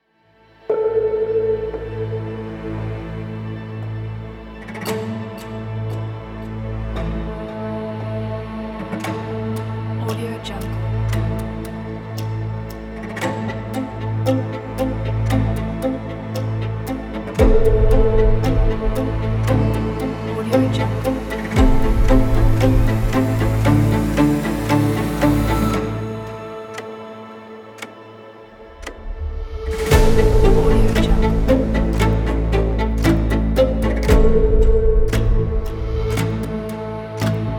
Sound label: countdown trailer